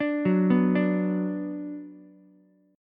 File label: F sharp Suspended 4th Sharp 5th, Ukulele; arpeggiated; 7,6,7,5; down